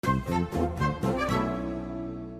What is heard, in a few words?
animated win sound